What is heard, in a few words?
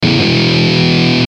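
Musical Element, Drum Snare March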